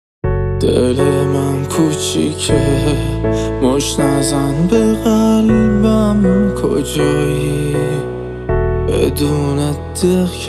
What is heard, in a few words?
Piano Version